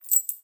Coins

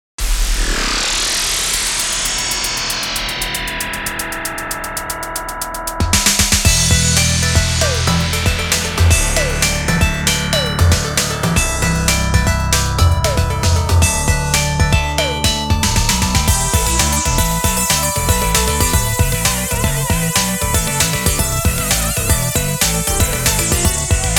FM Tone Generator; Groove Coaster 10th Anniversary Original Sound Track

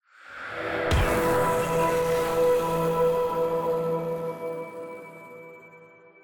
Transition; Everspace 2

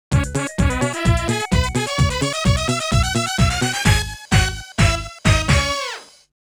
Turkey Jingle; Family Bowl; arcade

